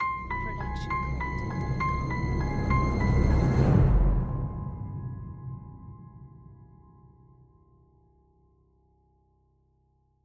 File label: Mystery Ident; soundscrate